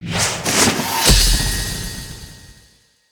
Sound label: Mastery Test Available, UI